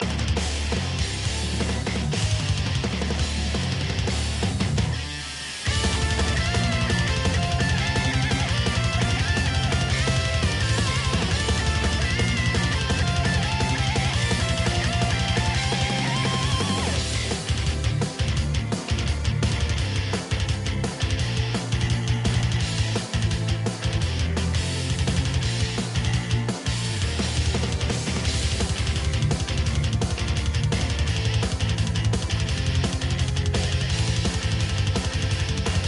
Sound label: metal inst